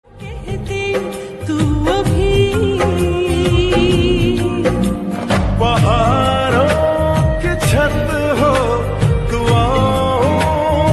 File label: lofi song